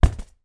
toy bop bag weighted inflated drop on carpeted floor